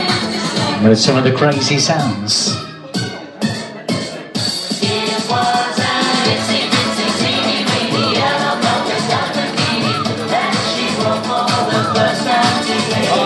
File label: our pub music